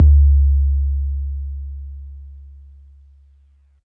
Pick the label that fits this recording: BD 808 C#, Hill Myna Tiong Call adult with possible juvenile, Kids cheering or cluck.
BD 808 C#